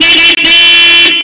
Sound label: Car Click